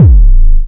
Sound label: Long Kick, Drums; Kicks